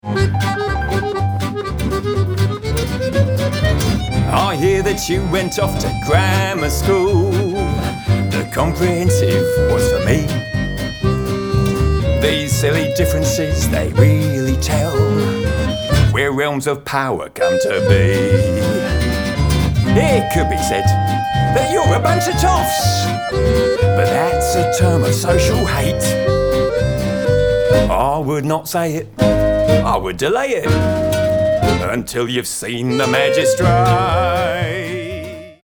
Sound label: accordion